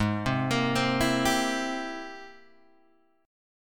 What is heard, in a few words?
Ab Augmented Major 9th Ab Guitar Open G; arpeggiated; 6,5,8,5,5,5; 4D,4G,5D,5G,5B,6D; Ab+M9; JGuitar